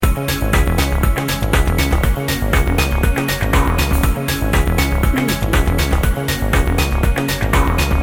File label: synthetic motion bed loop